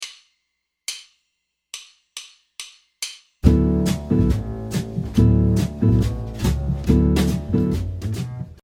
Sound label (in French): battue comping charleston, guitare jazz